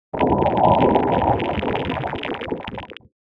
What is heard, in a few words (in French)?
eboulement